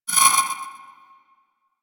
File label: Library Scanner Zoom In, UI; Warframe